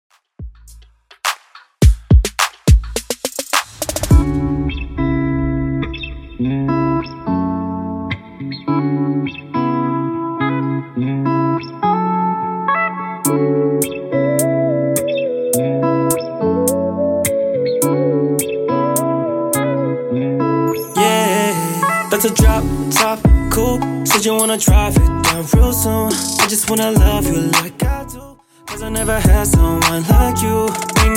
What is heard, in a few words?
Rnb Pop DJ Friendly Edit 105bpm